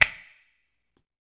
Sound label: Body Perc; Spectrasonics; Supreme Beats; World Dance